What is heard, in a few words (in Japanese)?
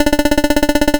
会話; nes retro talk sound effects